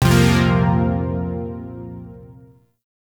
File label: Shawzin Pent Min Chord A; Chords; Warframe; Zariman